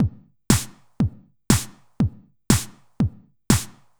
House Drum NoHH Reverb

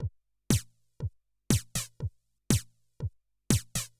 House Drum No HH Flanger

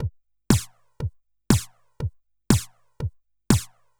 House Drum No HH Flanger

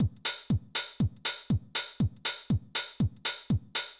House Drum NoSD Reverb

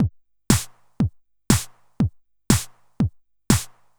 House Drum NoHH

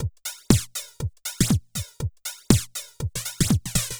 House Drum Flanger

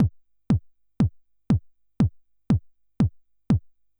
House Drum Only BD

